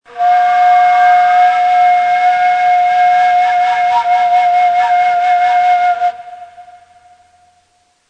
Hisar, Ney

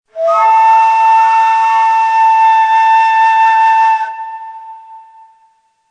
Gerdaniye, Ney

